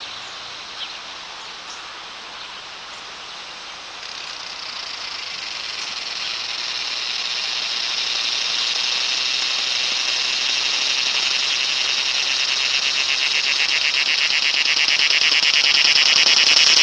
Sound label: bird